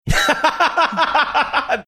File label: laughing